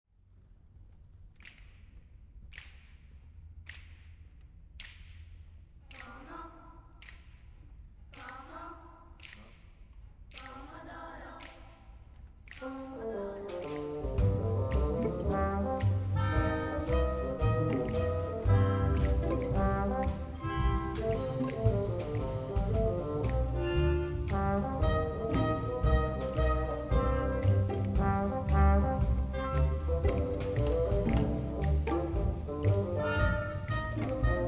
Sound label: blues